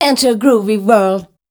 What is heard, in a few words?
PHRASE, Voice Spectral; Best Service; Voice Spectral II